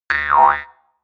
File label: mouth harp